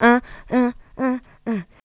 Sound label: Vocals, Adlibs; Houseworx